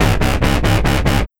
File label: Sound FX Wobble; Keygenerator